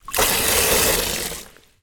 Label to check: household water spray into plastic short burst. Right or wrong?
Right